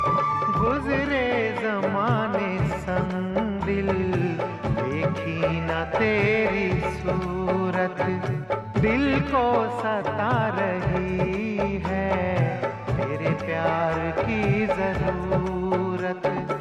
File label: Old Song Tone